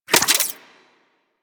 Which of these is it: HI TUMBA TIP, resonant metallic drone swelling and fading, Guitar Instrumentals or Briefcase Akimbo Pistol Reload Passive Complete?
Briefcase Akimbo Pistol Reload Passive Complete